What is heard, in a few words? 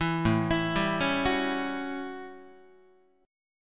A 13th A down Guitar; arpeggiated